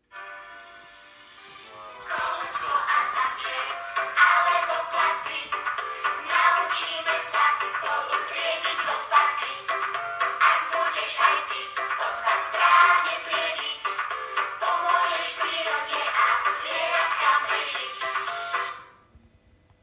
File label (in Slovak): Hudba z koša